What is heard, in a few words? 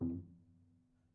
Prepared Piano